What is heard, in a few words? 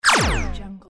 laser pistol